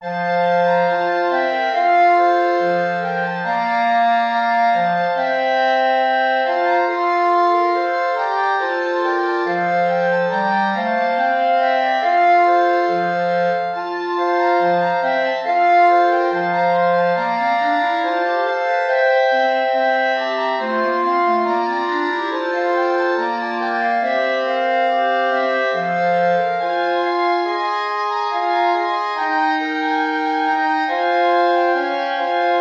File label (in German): Ballet I (4 Klarinetten in Bb)